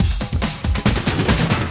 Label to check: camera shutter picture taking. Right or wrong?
Wrong